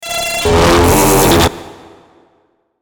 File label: Electrical Buzz Warble; Blastwave